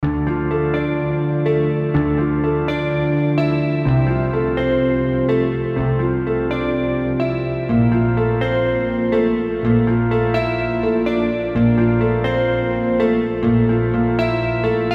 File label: relaxing guitar loop